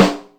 Dry gy rim, Yamaha DTXpress Acoustic Snare

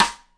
SIDESTICK; Instruments & Percussions; InVision Interactive; Lightware VOL-1

